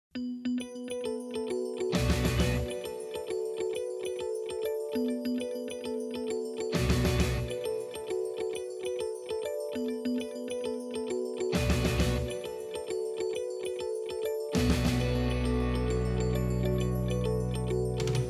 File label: rock